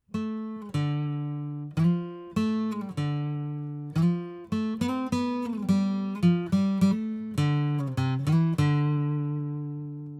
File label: Guitar